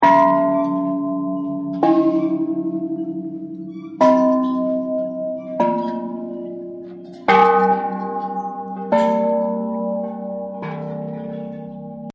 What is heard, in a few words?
Buddhist Bells; Musical; Buddhist Bells 2